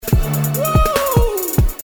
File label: wooooo